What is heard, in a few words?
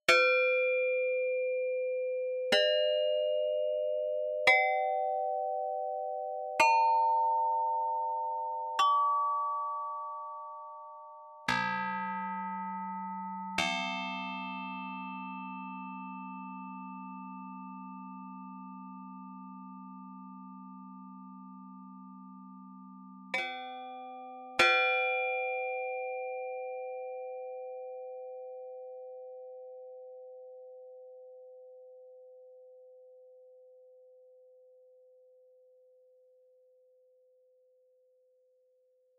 gong